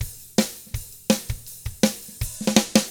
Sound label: ROCK, Drums